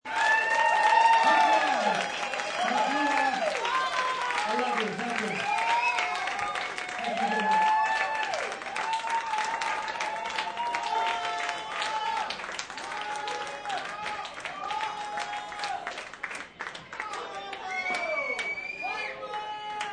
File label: Group Cheer Thank, Cheering, applause & bar ambience, group; Crowds; Voice Box